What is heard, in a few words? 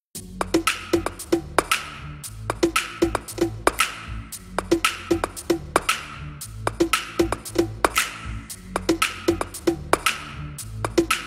tropical remix